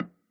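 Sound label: button general; hades River Of Souls Desktop; Fantasma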